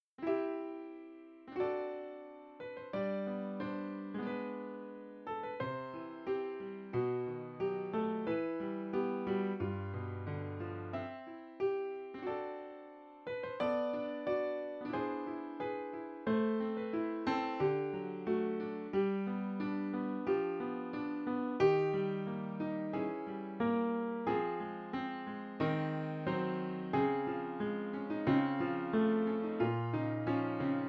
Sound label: piano